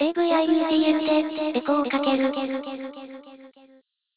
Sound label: delay; Audacity